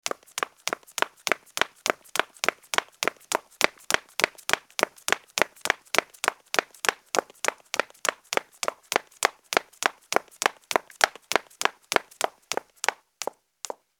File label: Footsteps Heels Marble Run